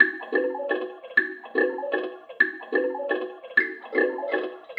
Mbira, Acoustic Beats; Sonic Foundry (Sony Creative Software); Myths of Technology

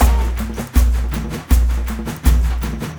AF TRANCE; Spectrasonics; Heart of Africa 2

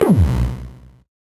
VocoBit Noise, 8-bit; musicradar; 8-bit bonanza

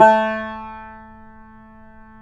yayli tambur

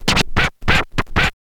scratch short, Vinyl scratches

